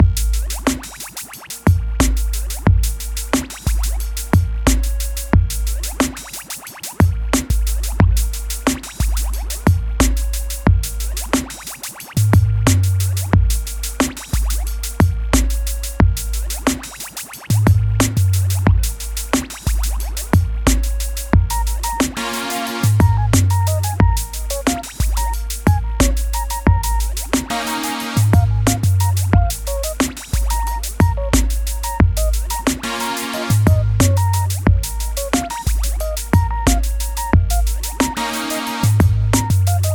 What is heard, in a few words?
pulsar menu